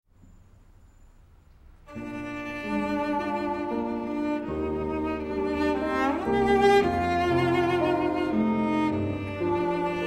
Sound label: Sonata for Cello and Piano in G Minor Largo LIVE; Chopin; Op65 III